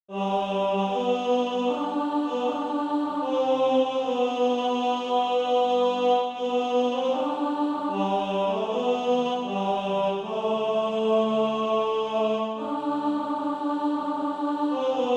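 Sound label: tenor, Practise Music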